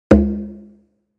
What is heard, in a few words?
Conga low single; gfxsounds